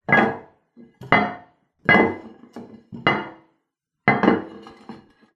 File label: household toilet cistern lid ceramic movements drop down various